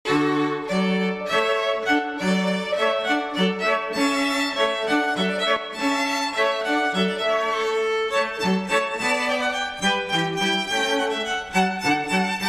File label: Hornpipe string trio